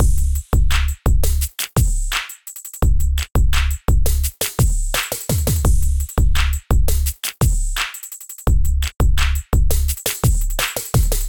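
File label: Electrified Kit, Drums; Ableton; Bomblastic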